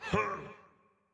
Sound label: Attack; Dinkubus